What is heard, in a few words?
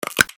loud crunch; emerald-crossing